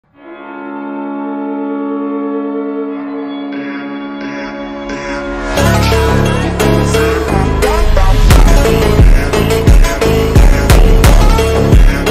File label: slowed reverb